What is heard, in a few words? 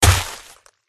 rhino attack contact up